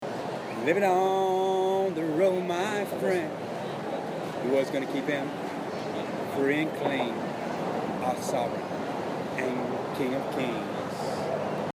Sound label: Singing